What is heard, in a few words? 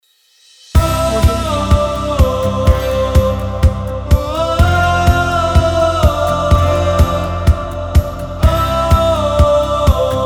uplifting indie background